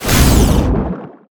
creature exo attack loop joystick and water; shadowleviathan

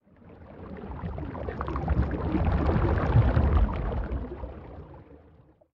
creature swim slow; glowwhale

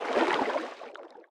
creature brinewing swim slow

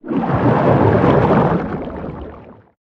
creature chelicerate swim slow; Subnautica: Below Zero

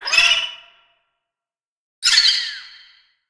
creature baby penguin call

